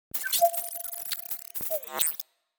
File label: promotion open